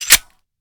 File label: slide rel, glock; weapons; Urban-Coalition; Decay; arccw ud